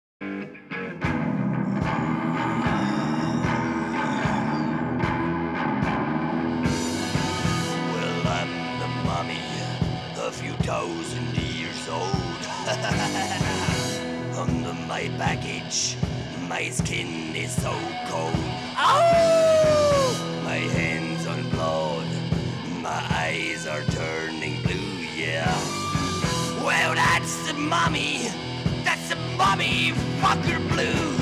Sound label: Jungle Noise Blues